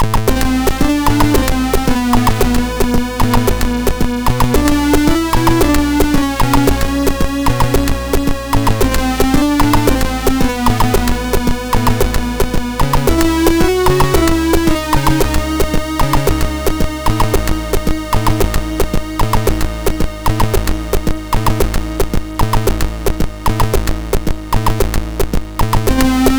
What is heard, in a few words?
First Drum, MOS6581R2